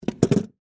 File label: Monophone Down, Technology, Telephone, Monophone, Receiver, Hang, Up, Antique; BlastWave